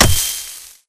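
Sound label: Impact Pulse Blade Sand; Titanfall 2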